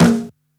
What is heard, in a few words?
Snare; Ableton Live 12 Lite Core Library